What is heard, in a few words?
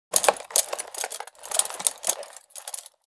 Prison guardhouse drawer rummaging and searching